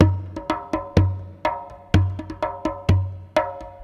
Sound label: PERC LOOP ETHNO 125; eJay